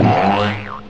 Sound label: boink